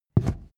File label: step wood step